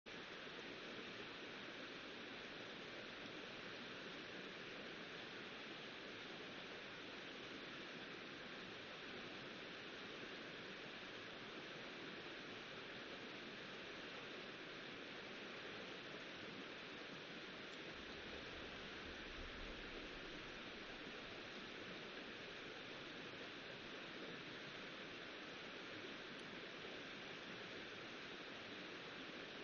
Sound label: riverside; Kyoto U; Ashiu